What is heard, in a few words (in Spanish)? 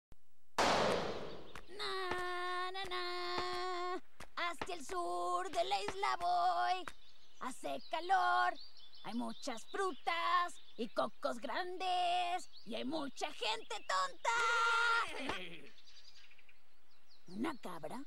Luffy Cantando Doblaje Latino